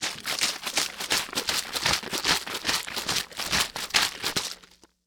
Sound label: Liquid Water Bottle Liquid Shake